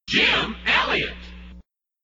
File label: jingles shout; positron; jim elliott